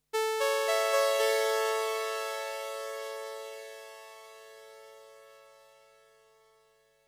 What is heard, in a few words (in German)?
ADSR A Dur; virusc